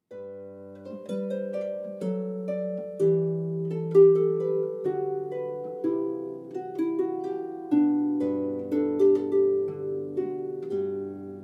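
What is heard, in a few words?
hornpipe, guitar violin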